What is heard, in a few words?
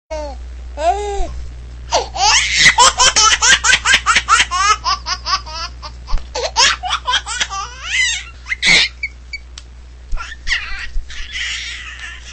baby laughing cute